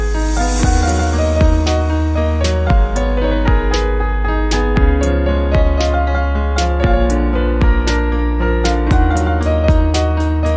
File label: Bollywood Instrumental